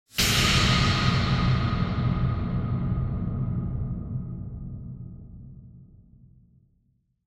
Onoma-Impact (Reverb)

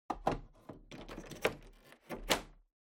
Air Purifier (Panel Close)

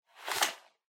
Handheld Transceiver Belt Clip Take Out

